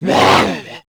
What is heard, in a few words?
kid zombie alert; nmrih; childrenofthedead; zerosiki; slender